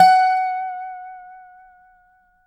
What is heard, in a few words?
DOBSUSF#5 -R, dobro notes; Country